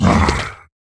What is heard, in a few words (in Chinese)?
劫掠兽咬声